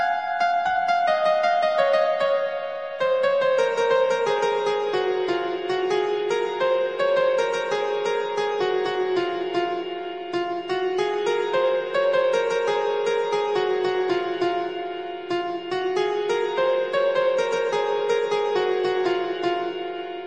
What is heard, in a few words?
Piano, ringtone